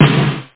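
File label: natural snare; OctaMED SoundStudio V1; jazzies samples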